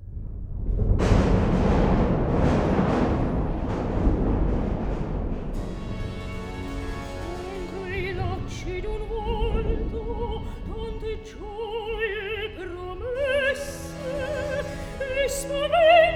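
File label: Recitativo accompagnato, Handel opera; Act 2; Dunque i lacci d'un volto; Handel - Rinaldo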